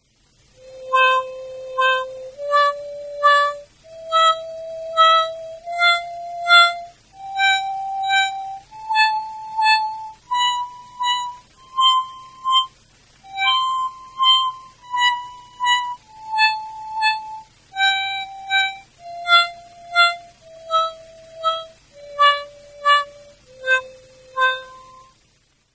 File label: gamma, garmoshki